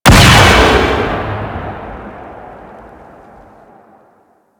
Gas Turret Fire; Ambience; Props; Warframe; Corpus Gas City

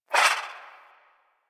Swing, Melee; Balance Four; Warframe; Weapons; Modular Melee

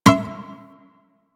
Shawzin Wrong Note A; Warframe

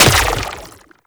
Grenade Bounce; Warframe; Lasrian; Lasrian Soldiers; Weapons; Lasrian Chem Nox

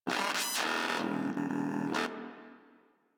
Moa Aggressive Vocal Enemy Spotted; Warframe

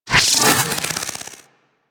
Spin Attack; Warframe; Corpus; Arachnoid Micro; Abilities

